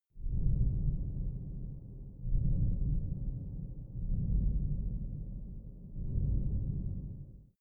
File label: space shuttle pounding metal reverberant; raumschiff